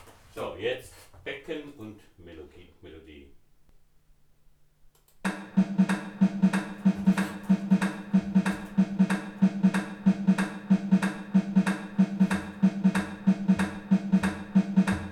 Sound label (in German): becken melodie